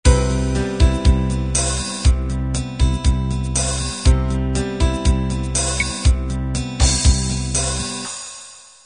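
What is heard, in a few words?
unplugged 8 beat intro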